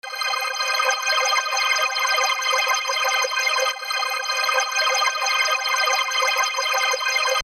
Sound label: ARp Straight Top